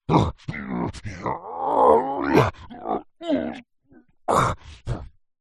fantasy giant male vocalisations lifting struggling pushing; zapsplat